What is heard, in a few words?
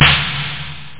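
TR808 SNARE; DRM PERC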